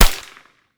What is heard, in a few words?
an94 silencer; metrocraft; weapon